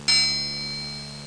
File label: triangle hit; Sound Effects for Multimedia - Volume 1; percussion instruments